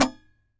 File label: SAZ TAP; Spectrasonics; Hans Zimmer Guitars VOL-2